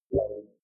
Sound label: query smooth, signale